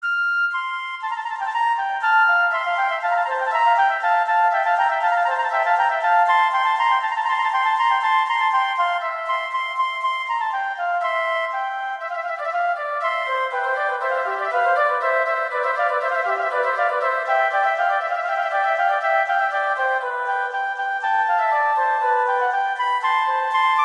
Allegro; Telemann; Canonic; Sonata 2

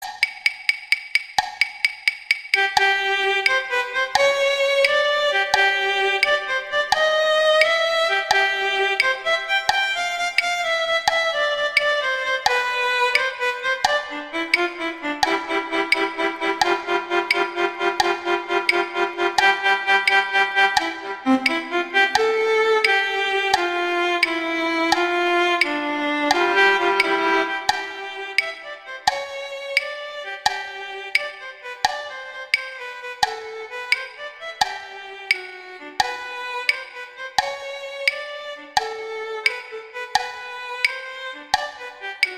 Allegretto Violin II; Duets; Mazas

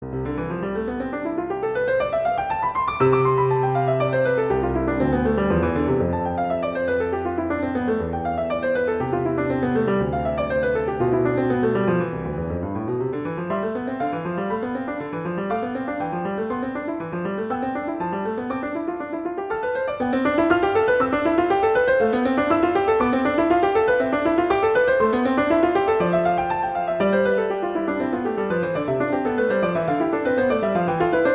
Exercise, C major; Clementi; Preludes

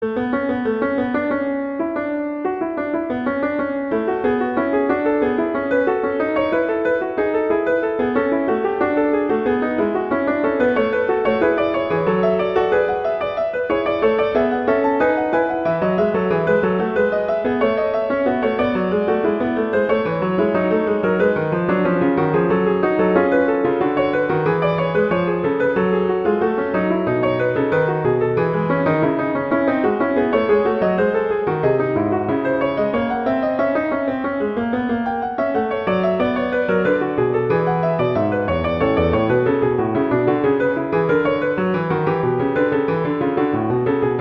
Fugue; Bach; Prelude Fugue XIX

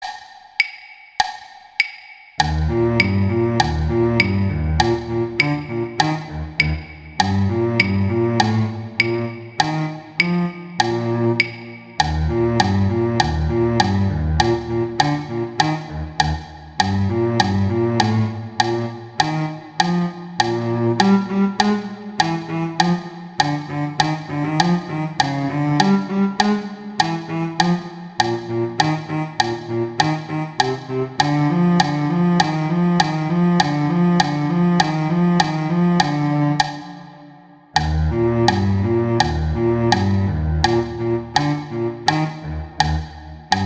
Cello; Duet 12; Cello I; Mozart; Duets

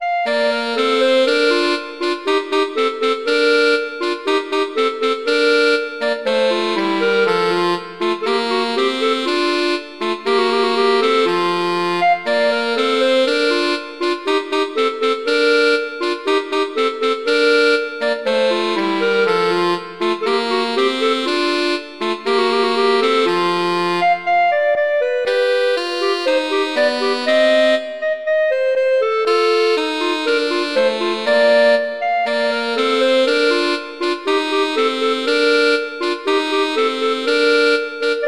Duet; Mozart; Duets

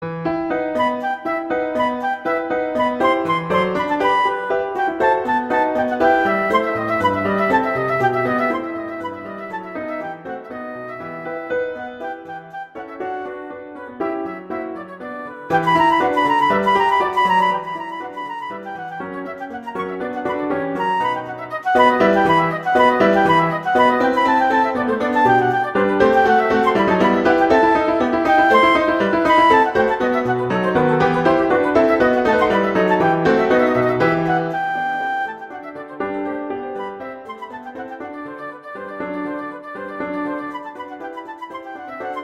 Allegro; Sonata; Handel

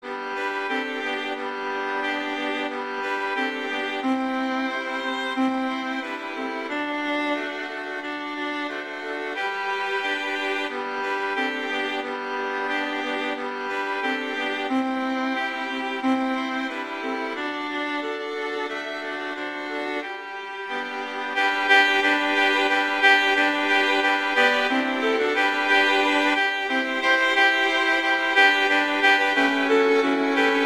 Jingle Bells, Christmas

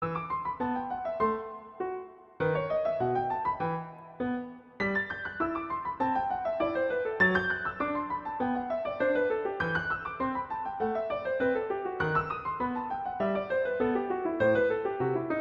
Exercise, E minor; Clementi; Preludes

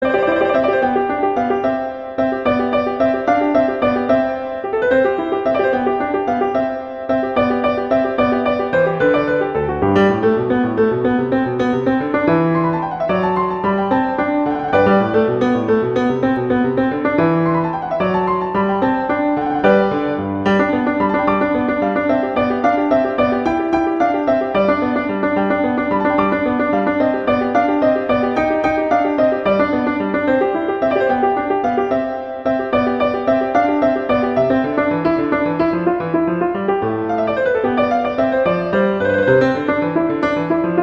Allegro; Haydn; Sonata 7; Hob XVI 7

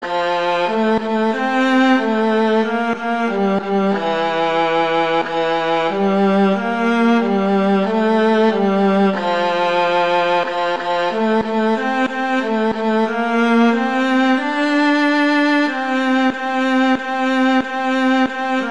Five Little Monkeys, Beginning Viola Solo

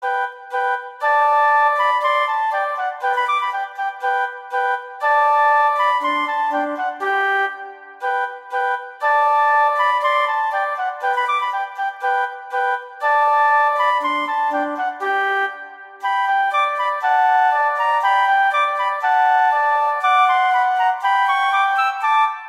Duet; Mozart; Duets

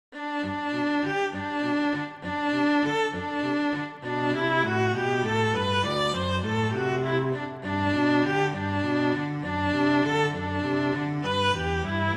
Rondo, Allegretto; Mazas; Duets; Duet 7

